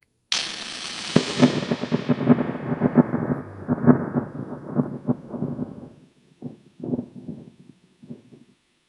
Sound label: quick magical lightning b